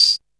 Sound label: CR78 Tamborine, Roland cr-78 drums